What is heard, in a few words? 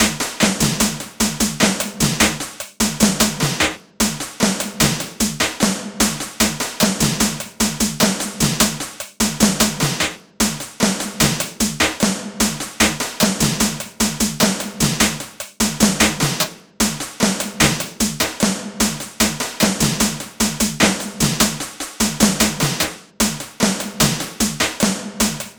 Snarez More 150, Percussion Combos, Abstract World Fusion; M-Audio; Pro Sessions VOL-38; Abstract World Fusion 2